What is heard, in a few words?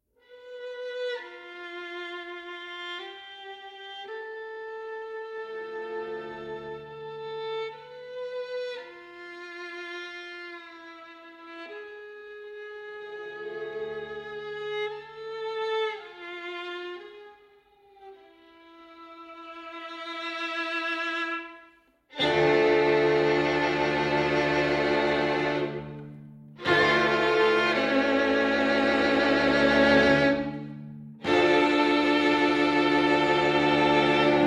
String Quartet in D Minor; 4th Movement